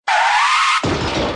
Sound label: Crash